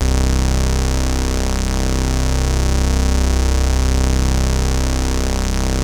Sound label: E-MU MOD 2 C2, E-MU modular; Greytsounds